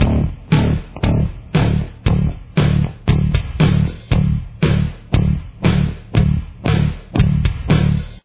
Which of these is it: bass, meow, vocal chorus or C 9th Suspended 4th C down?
bass